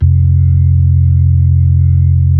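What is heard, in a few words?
B-3, Hammond B-3 organ; Roland LCDP10 Keys of the 60s and 70s 2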